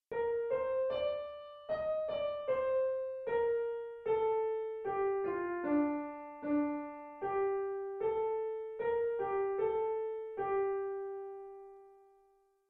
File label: aeolian